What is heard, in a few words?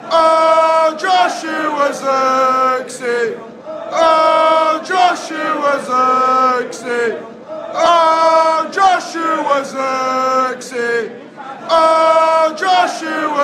player chant, chants; zirkzee; manchester united